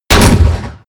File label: shotgun close shot